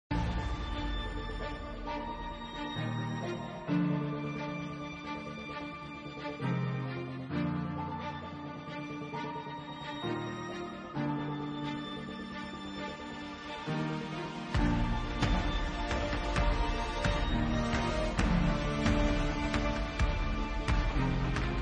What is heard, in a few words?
bgm; GunfightShoot